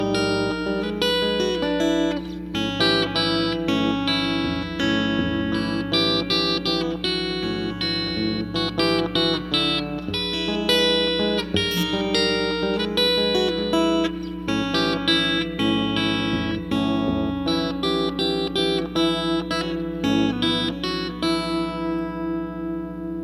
Deep River Blues

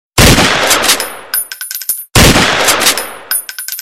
Gun Shot

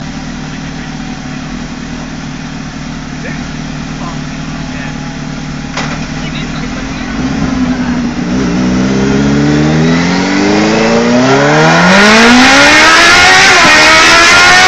Suzuki Gsxr-1000

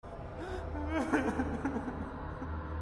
shy guy crying

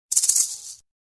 Snake Sound; meloboom

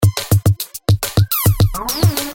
Boop Woo Wee Woo

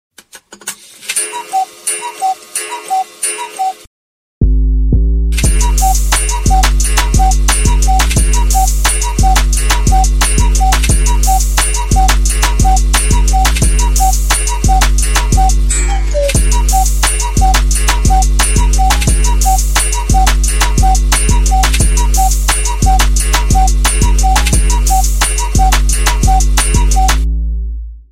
Alarm Clock Remix

